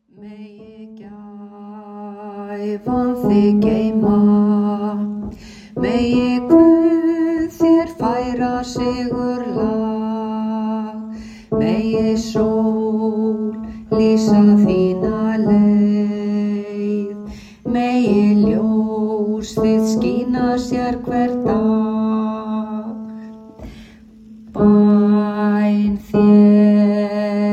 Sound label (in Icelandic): tenor; Megi gæfan þig geyma